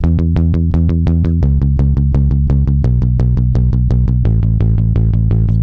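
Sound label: Bass Punk E major 170 bpm; Guitar and Bass; Ableton; Factory Packs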